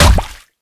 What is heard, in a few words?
hit